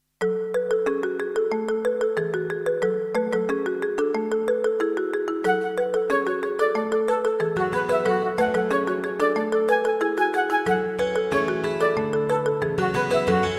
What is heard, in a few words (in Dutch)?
fusion, Een moeilijk te beklimmen rotswand; AG fusion